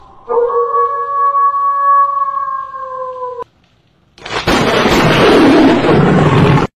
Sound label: auuuuuu